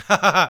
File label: WHISTL; Not Brass; Roland L-CD702